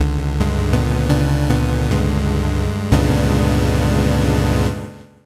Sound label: game over